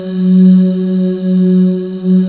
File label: SYN VOI, Roland JD 800; Sound & Vision - Gigapack I CD 2; JD 800/990